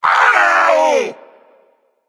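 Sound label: nonverbal ouch; smite; voicepack; merlin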